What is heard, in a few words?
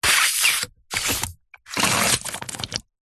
foley balloon pump suck in water bubbles gurgle squelch; zapsplat